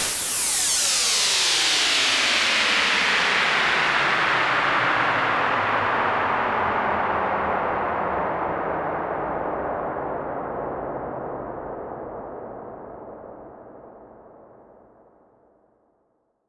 Downlifter

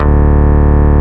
Bass D, Root D

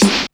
Snares & Claps; Vengeance Samples

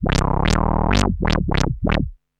Arp D Line, ARP Odyssey; Lines; synth; musicradar-synth-samples

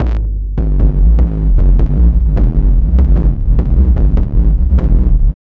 Bassdrums Hard Kicks; Vengeance Samples

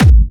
Bassdrums Clubhouse; Kicks; vengeance essential club sounds-3

